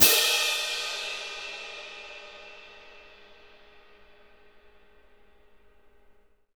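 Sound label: cymbal, Orchestral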